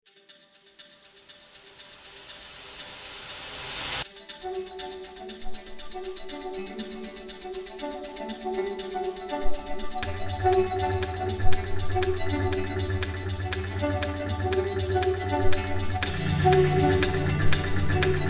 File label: the canjo,small; Embertone